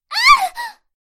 FEMALE STARTLED SCREAM

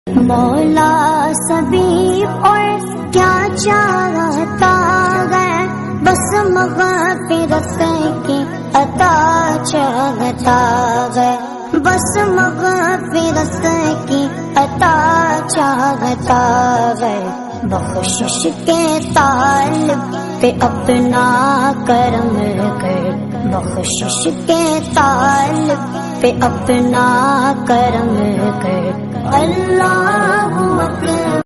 NAAT SHARIF PAAK RINGTONE

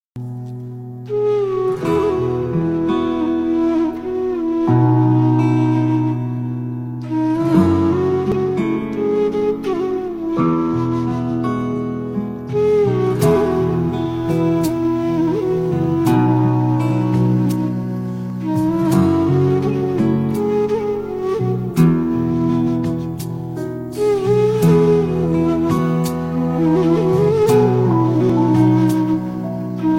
Hare Krishna flute ringtone

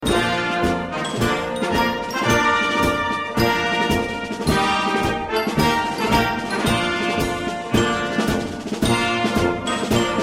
BATTLE HYMN RINGTONE

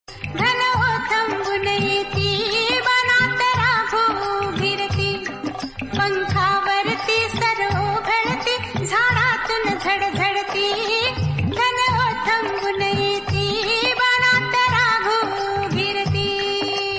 MARATHI SONG RINGTONE

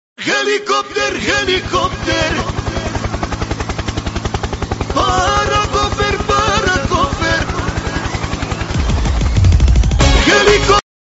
HELICOPTER HELICOPTER

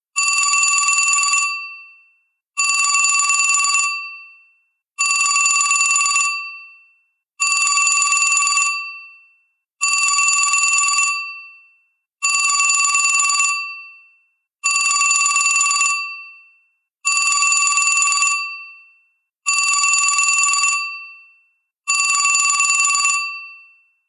LG MOBILE VINTAGE TELEPHONE RINGTONE

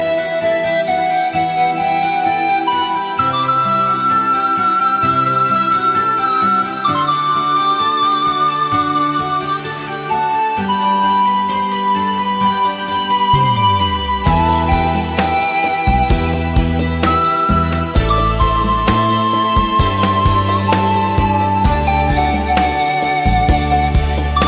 CLASSIC RINGTONE

